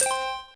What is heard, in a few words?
Earn